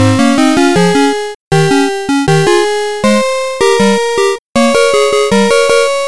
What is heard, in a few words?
harmony; music